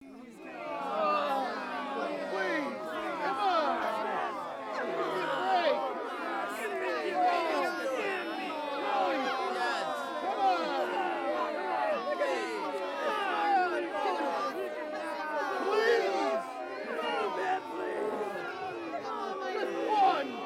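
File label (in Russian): злая недовольная толпа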